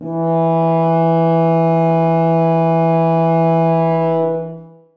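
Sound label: tuba sustain e3; Sonatina Symphonic Orchestra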